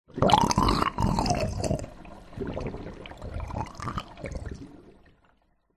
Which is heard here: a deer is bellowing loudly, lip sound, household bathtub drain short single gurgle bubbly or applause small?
household bathtub drain short single gurgle bubbly